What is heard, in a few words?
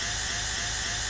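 gun minigun loop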